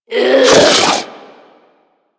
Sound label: Infested Diseased Ancient Breath; Warframe